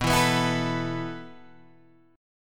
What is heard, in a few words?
E Suspended 4th B